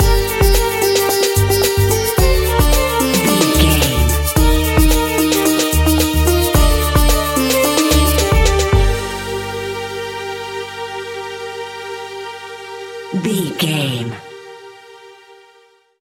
native indian music, An exotic and colorful piece of World music. Groovy, powerful, exotic and wild. Non western